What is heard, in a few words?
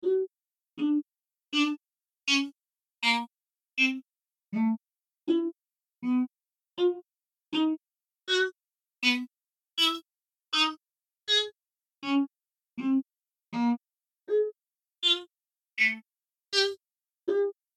percy String, chimeras